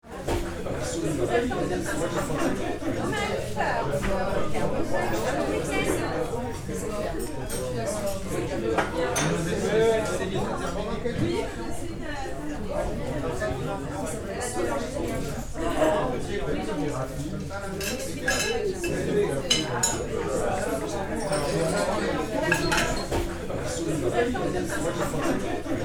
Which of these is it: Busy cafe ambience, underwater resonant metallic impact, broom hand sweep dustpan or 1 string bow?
Busy cafe ambience